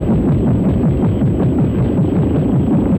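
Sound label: HELICOPTER, VEHICLES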